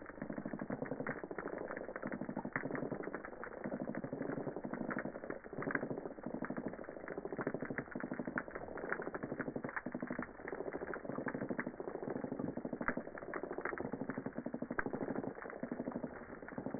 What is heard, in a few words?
Sciaena umbra Chorus